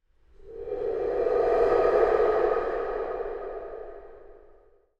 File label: Sound Of Wind Comes From The Tunnel